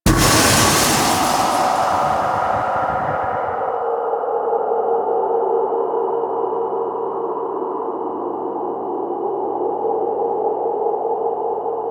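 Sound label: Hull Breach Depressurize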